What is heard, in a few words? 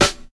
Crispy Steel Snare Drum D Key; snare drum samples